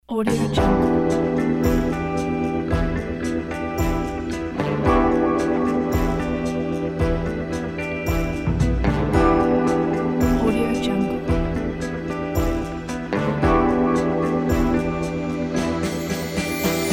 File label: 60s secret agent